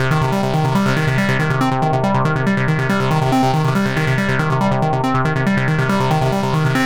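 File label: 140 bpm C minor synth, Trance Synth Loops; Trance Explosion Vol1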